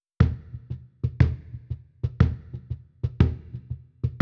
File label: Cajon